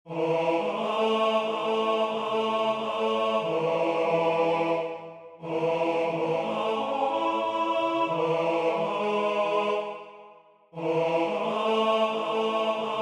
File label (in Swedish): TENOR; Hosianna